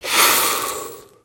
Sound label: Fire Extinguish